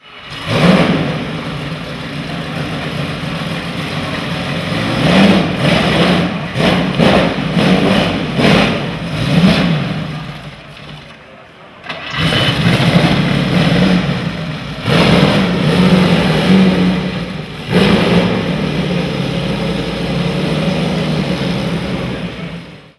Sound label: Maserati Racer, oldtimer